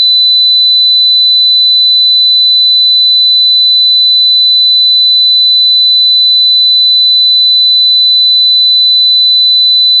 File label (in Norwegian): Sinus 4000 Hz; oresuslyder